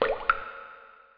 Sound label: sewer drop; Revista CD Expert 42; Grounch